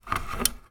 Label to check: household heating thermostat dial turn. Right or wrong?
Right